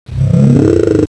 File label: bump; quake2; okta